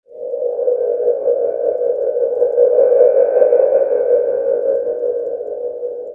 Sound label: windmachine